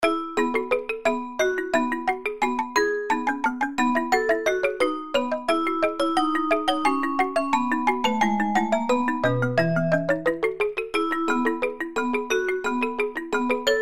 virtual mallet percu; syntheway

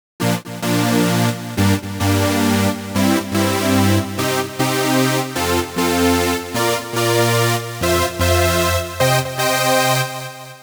basic lead sound

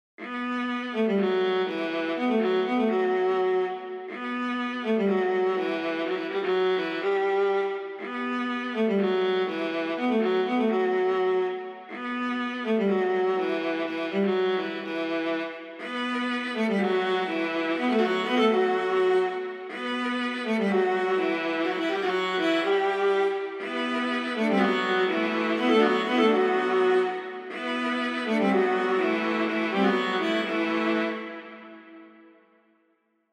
strings viola; captains strings

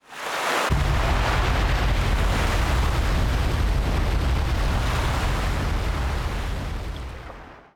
stinger tsunami

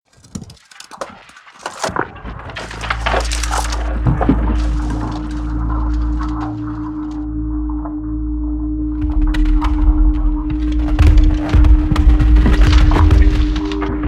designed rocks

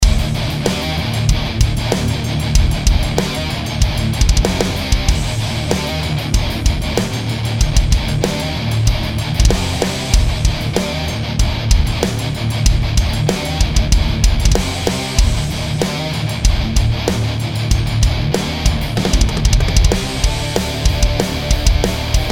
metal kick samples

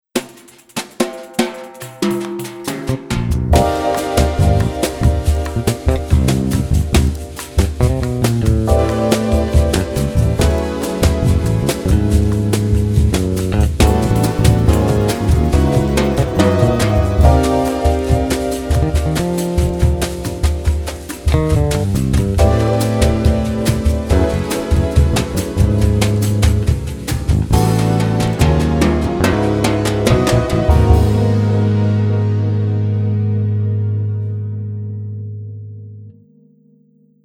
legato brushes bossa nova

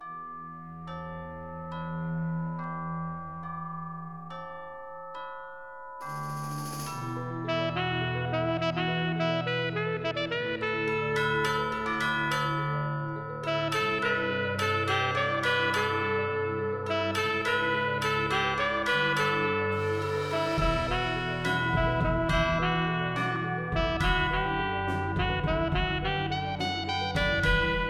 tub bells

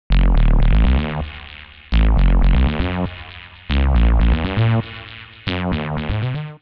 fat phaser fx seq

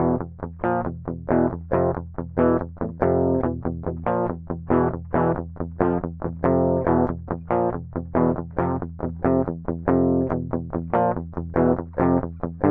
metal dry no effect

